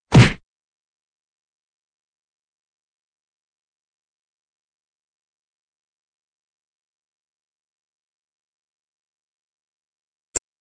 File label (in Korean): Fights, 싸움-전투; 헐리웃특수효과음